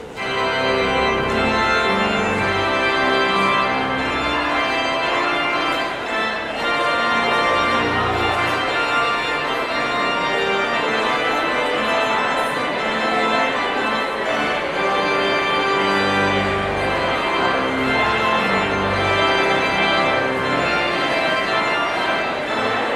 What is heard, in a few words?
Postlude